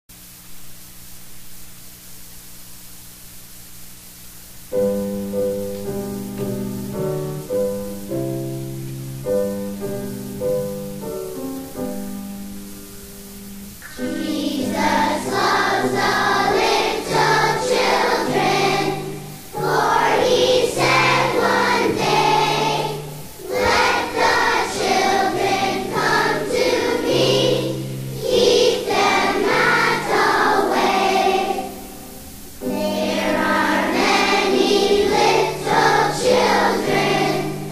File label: solo age 5